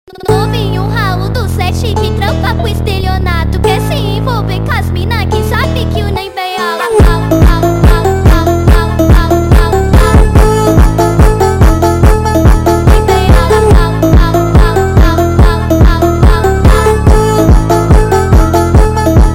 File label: Mambo Sped Up